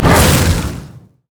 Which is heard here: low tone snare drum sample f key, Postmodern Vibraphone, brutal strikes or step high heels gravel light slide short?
brutal strikes